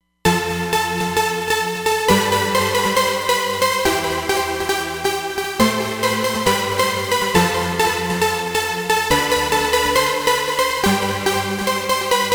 JP Oct Attack, Roland Fantom XR

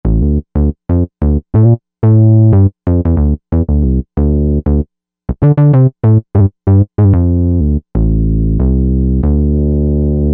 Creamy Bass

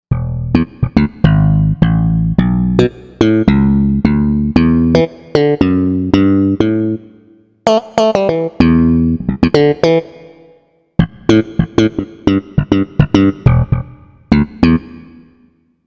Slap, Korg M1Rex; Factory Patches

